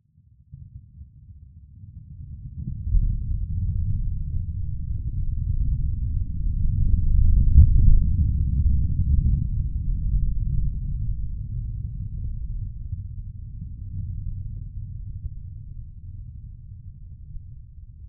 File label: lfe tremor rumble; sound design